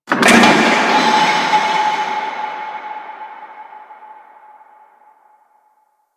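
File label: Shipyards Distant Machine One Off, Ambience; Warframe; Grineer Shipyards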